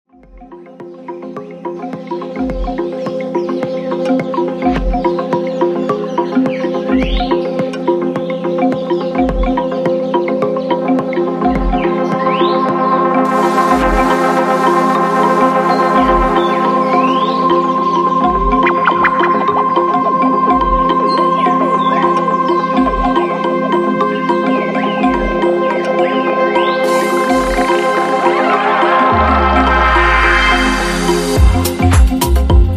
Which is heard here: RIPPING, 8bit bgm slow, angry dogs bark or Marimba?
Marimba